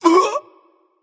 grunt hit; smite; susano

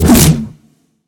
swing small; smite; god arthur

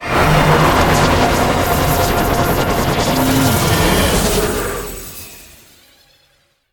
activate; Smite; Tiamat

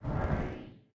swish; smite; god thanatos

